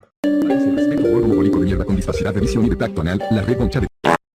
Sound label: fart; plants vs zombies